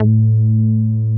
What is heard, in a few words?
SH 101 BASS